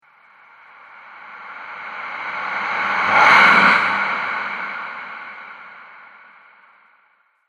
Haunting Halloween Spirit, ghost

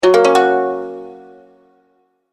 Message Alert, beep

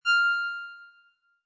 Musical, Button sound or menu sound; Multimedia; Button Sound Effects

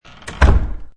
Big Door Shut, Big door shuts; Door Closing; Sound Effects:Doors

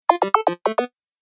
Retro Gaming Sound; Video Game Sound Effects

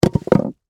Impact Rock Drop, Heavy, On, Rocks; Materials; BlastWave

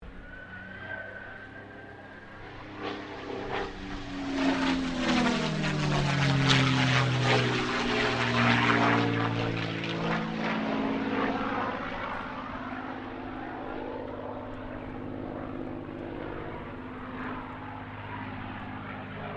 AIRCRAFT CAC CA-18 MUSTANG MK23 PASS WINDY; CA-18 /P-51 Mustang; Airplanes; Vehicles

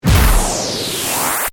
Hit To Whoosh; Hit Sound Effects

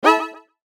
CHIME DELAY; Multimedia; Chimes; Blastwave

